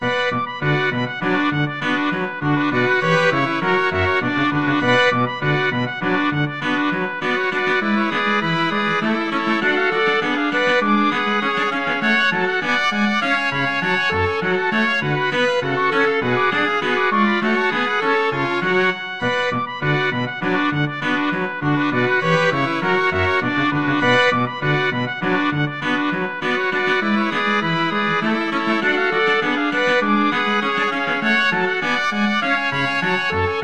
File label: badinerie, string quartet; bach